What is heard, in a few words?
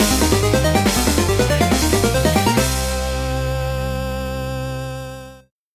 Gameover; ninja senki dx; macos ps vita ps4 windows